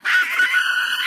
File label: Scream, F#5; 音MAD; 孤独摇滚; Melodyne